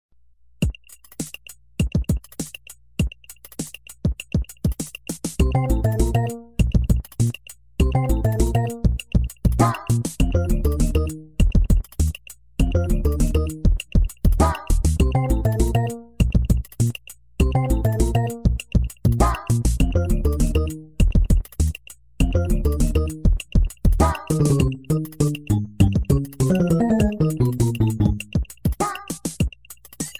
Music Underground Theme; NSMB

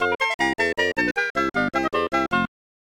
Lose

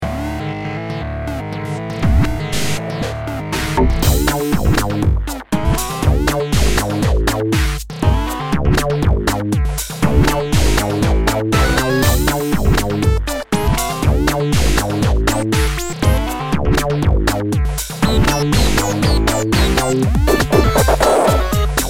Chain Chomp's Mad!

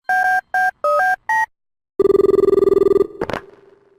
Phone jingle; MP3; Cellular Shopper

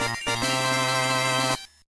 Task Completed; Shroom City

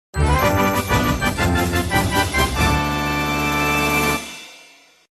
Start Battle

